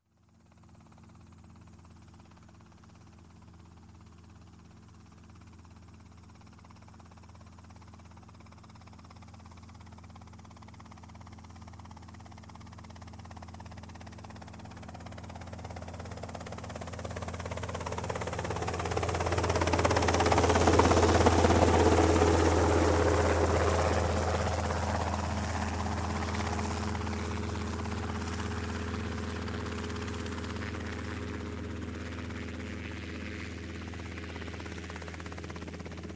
Helicopter Robinson Pass By - Slow; Sound Ideas 2000 Series

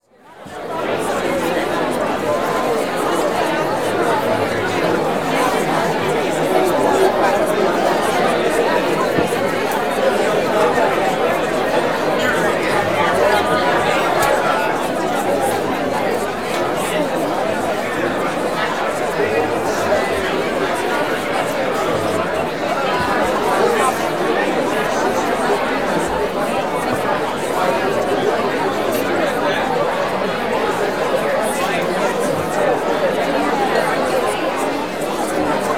Crowd Indoor Busy Reception Party, Walla; Sound Ideas; Walla Series

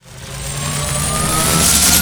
Elec Energy Charge Up; Energy & Forcefields

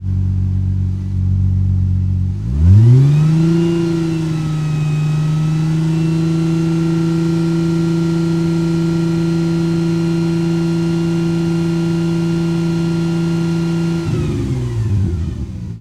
Prowler Fast Idle, Automobiles

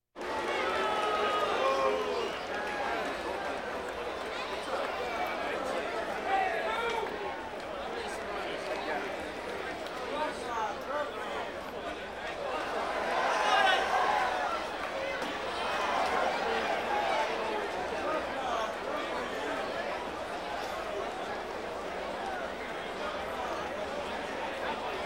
Crowds Race cars Baseball

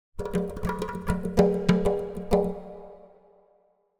Drums Exotic Percussion Beat Finale; Hollywood Edge; sound designer's toolkit v2

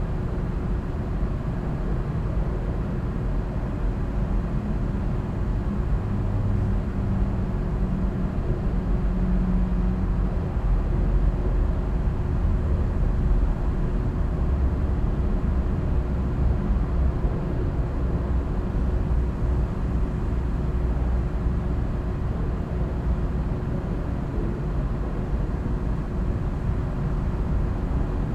Urban din night traffic rumble electric hum; urban and suburban; boom library

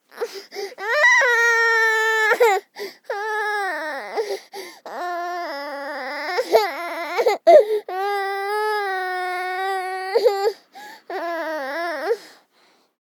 BABIES WEEP Girl 24 months; Baby Boom

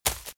Footsteps - Leaves - Sprint - Left; RadwinIsland

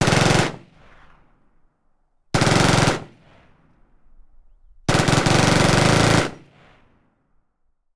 Machine Gun; Sound Ideas; Guns

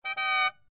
horn motorbike; Blind Drive

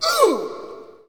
Hockey Male Hurt; Hockey Vocal Sounds

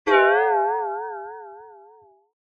Impact Comic Pulsating Metal Hits Low; Hollywood Edge; sound designer's toolkit v2

